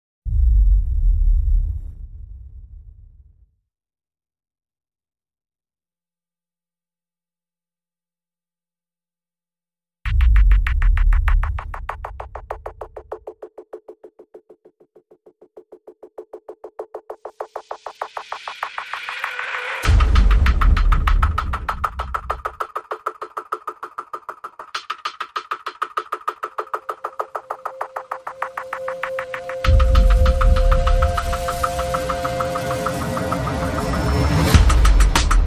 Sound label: Drums and SFX